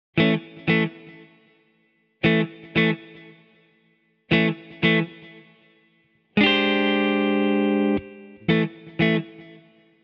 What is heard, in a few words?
Blue Funk